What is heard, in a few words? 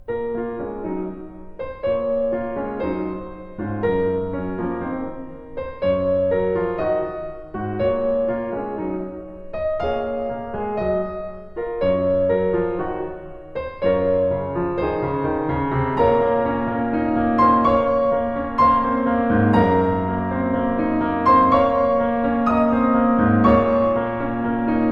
piano, allegro